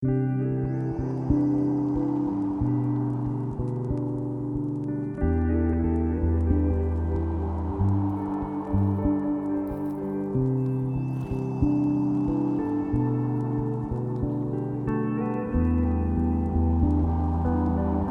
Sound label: lofi hip hop